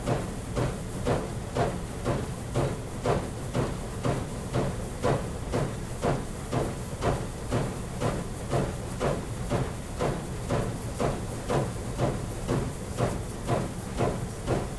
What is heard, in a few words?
train steam 4-6-4 royal hudson h1e class ride engine rail clatter rhythmic; constant; sonniss gdc